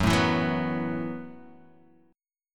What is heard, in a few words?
Gb Minor 11th Gb, Guitar Half Step Down